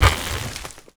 pull wood

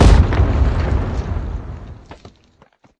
emerge explosion

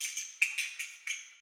ambient; World of Tanks

